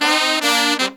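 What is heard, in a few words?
Pop & Funk Brass, 110 Cm, right; Best Service ProSamples vol.25; AKAI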